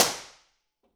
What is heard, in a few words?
1.0s Warehouse, Impulse Responses; Small Spaces; Indoor Spaces; Apple